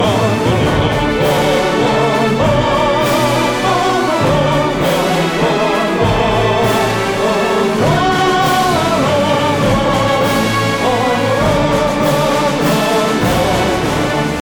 Classical Anthem Loop Cont

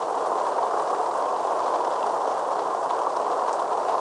water roof interior loop

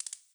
numbers rotate short

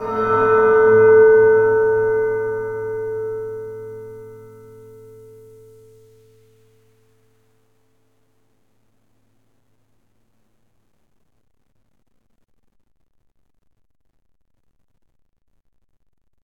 Mus Church Fade to prison

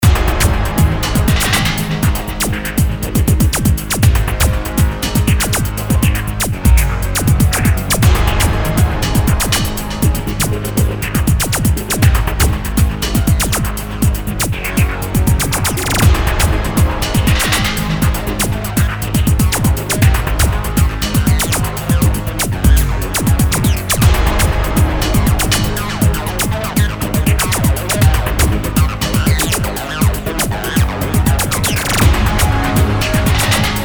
Amazon - Ambience

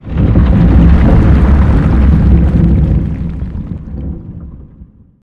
Sfx Colossus Movement; Minecraft Story Mode

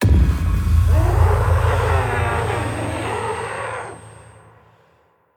Player Knockout; The Town Sam 2D; Devour 2021 gamerip pc macos

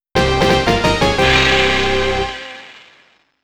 ROUND CLEAR (Arenji Baajon); Namco Sound Museum from X68000

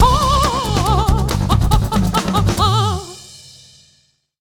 (Jingle) Victory; rayman arena; Razorwife

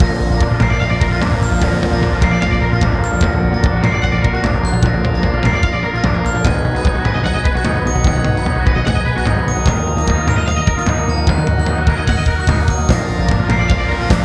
Boss Battle, Glockenspiel; Octavo Boss Battle [Glockenspiel Ver.]; Cadence of Hyrule

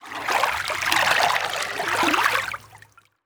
environment water calm

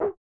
Tile Match (Woof); Shanghai 2 Dragon's Eye; snes

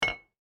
Jam Landing; my cute commissar 2020 gamerip

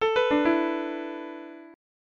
Tada; Heisei Shin Onigashima; snes

